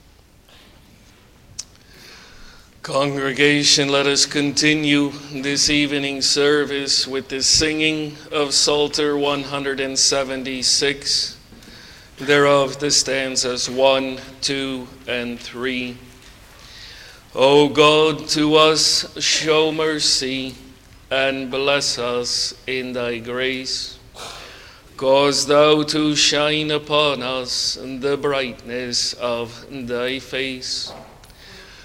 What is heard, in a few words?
Singing; The Prayer of God's Congregation